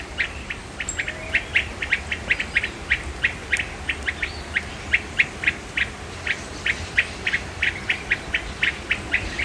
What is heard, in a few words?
brown crested flycatcher, Bonaire; birding by ear